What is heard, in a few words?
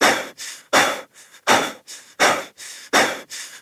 breath heavy fast adult; New War; Adult Operator